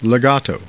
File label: legato; Total History! - Disc 3